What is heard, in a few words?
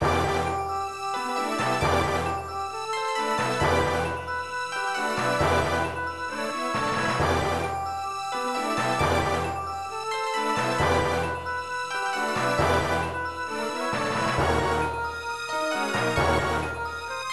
BGM Forest, Cave; dungeons dragons tower of doom; cp system ii arcade